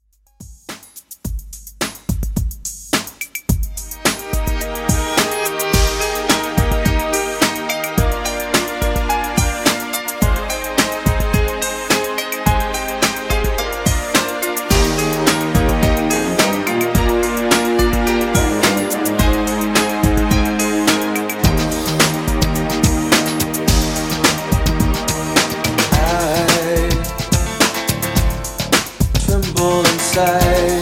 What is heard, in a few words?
90s Extended Redrum 107Bpm